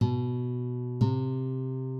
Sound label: minor second